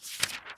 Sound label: turn Page